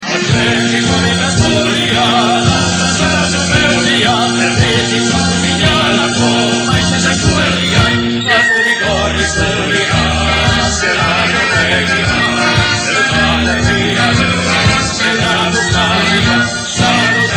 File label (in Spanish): himno; athletic bilbao